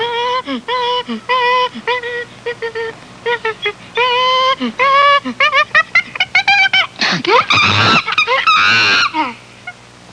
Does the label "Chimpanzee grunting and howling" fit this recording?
Yes